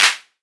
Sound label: natural hand clap c sharp key, hand clap sound tuned to the C# note; single hits one shots